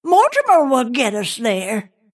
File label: snapfire move pain